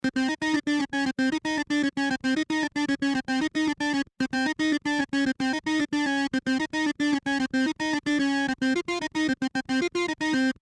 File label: Game Ultrafast Dark Danger Theme; 8 bit - Gaming - Schnell